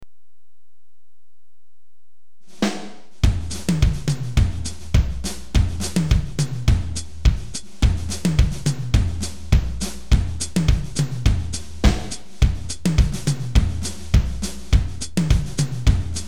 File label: Drums